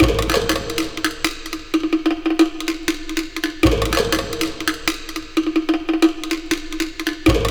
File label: WAR DRUMS; Zero G - Ethnic